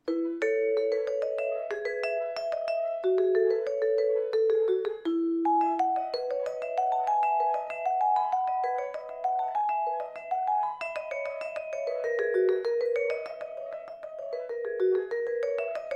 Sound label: Bouree, Violin Solo; J.S.Bach: Partitia for Violin Solo